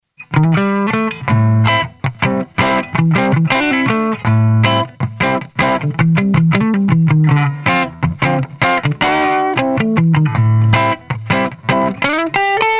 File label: Guitar Comp; Golden Age Project, COMP-54